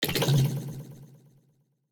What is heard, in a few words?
Collect; SNKRX